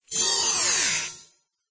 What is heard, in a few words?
science fiction door vehicle open or close electronic; zapsplat